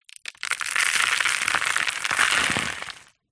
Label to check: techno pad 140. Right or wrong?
Wrong